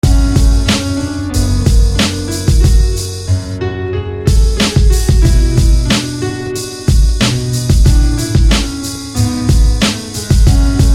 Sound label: Piano; Dust; SFB FX